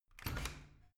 washing machine open

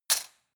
cutlery plastic drawer place hard

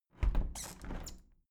window plastic squeak close soft sequence

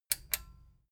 metal button stereo click enable slow

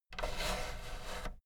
fader plastic scrape double up slow

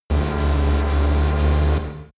space driving construction machine high

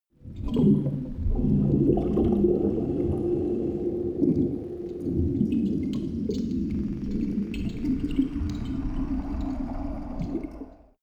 water fx

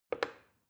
plastic button start click soft fast

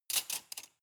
cutlery large drawer place soft

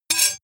sword blade rattle short

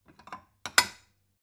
stone bowl shelve

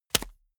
small punch hard

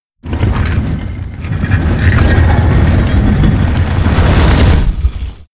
stone door open

